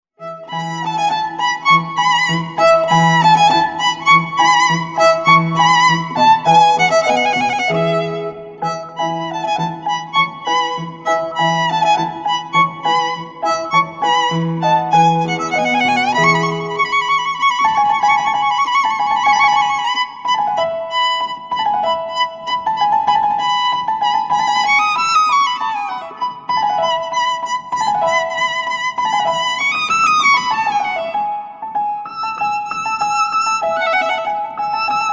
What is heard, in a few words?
Violinist Classical; Vivaldi; Spring 1st movement